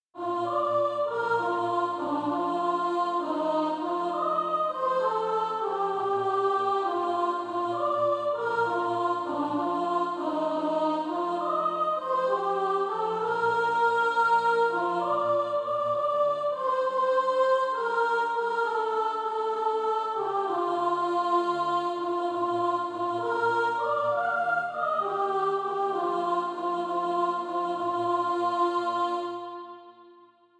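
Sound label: soprano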